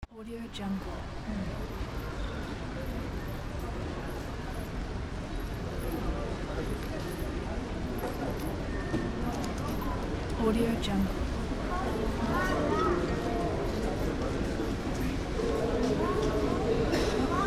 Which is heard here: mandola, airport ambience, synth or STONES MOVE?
airport ambience